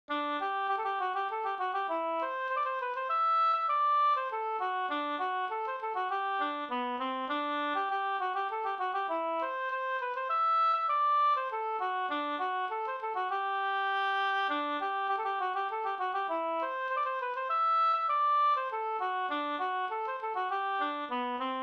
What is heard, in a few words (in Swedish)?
polska polska